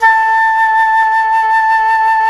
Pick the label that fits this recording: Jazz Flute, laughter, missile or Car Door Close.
Jazz Flute